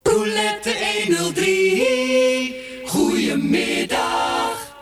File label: silence